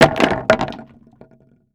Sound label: Impact Objects Debris Dropping On Hollow Wood Short; Impacts